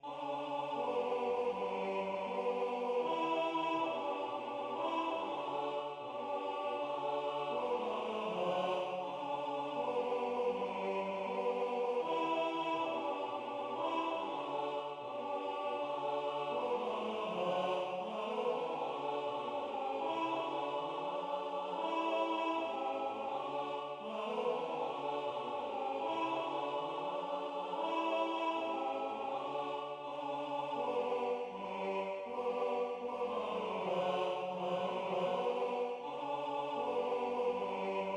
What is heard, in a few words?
Tenor; Spazzacamin